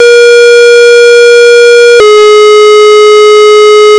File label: tones